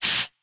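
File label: attack distance shot rifle spray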